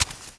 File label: Fox Walk